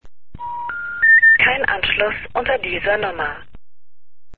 deutsch, international telephone sounds

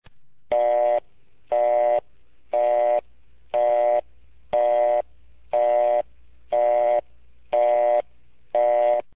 old busy, vintage telephone sounds; miscellaneous vintage sounds

